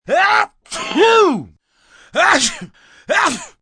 Sneeze, male, loud sneezing fit, big man, cold, sick, (human voice); Sneezing Sound Effects